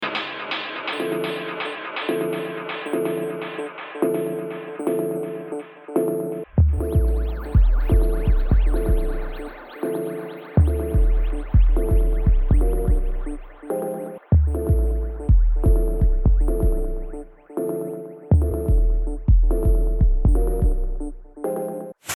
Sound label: API2500 4 to 1 comp